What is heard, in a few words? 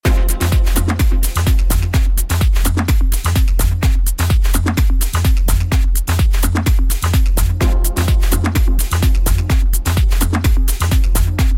drone; flOw